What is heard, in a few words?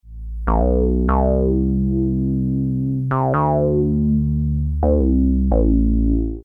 bass